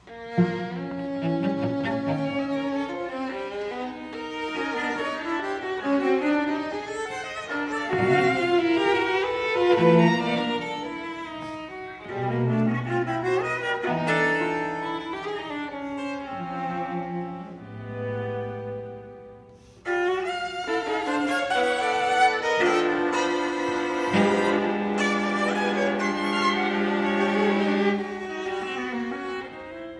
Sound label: String Quartet, Allegro Assai; Walker (George)